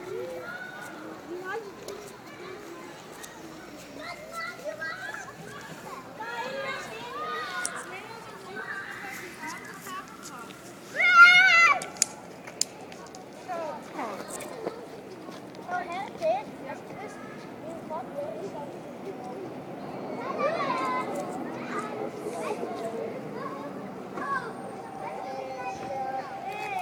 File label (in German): Soundscape